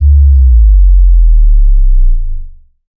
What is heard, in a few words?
bass drop stomp